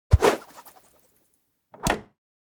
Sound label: frisbee goal; anemoi